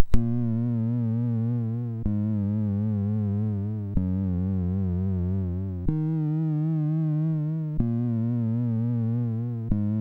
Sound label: sid MOS6581R4